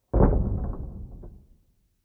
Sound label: Deep Wooden Impact Stinger Long Reverb Decay; Tom Hutchings